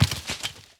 Crater Tower Rock; unsighted

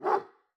Dog Doberman

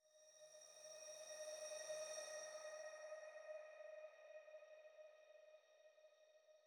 flashback Teleport Light

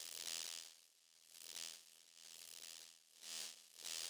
Jellyfish Mini Idle Loop

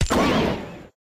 Samurai Disappear

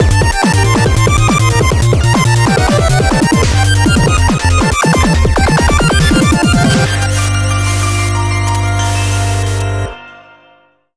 8bit BGM; Caladrius AC; RingEdge 2

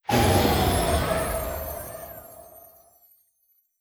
Yeti Breeze Spell

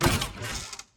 Open Chest

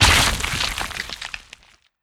Mermaid Death stab

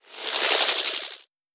Jelly; Legend of Keepers (gamerip) (2019)

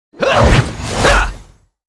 ArcSmith skill

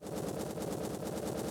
Centipede Hatcher Fly Loop

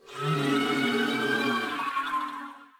deity single scream; unsighted; enemies

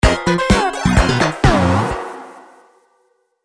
Mus Lose; Mindwave

